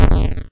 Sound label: 8bit noise